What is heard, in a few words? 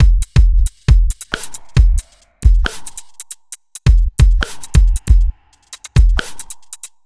Bpm136 Almost Break, Dubstep Drum Loops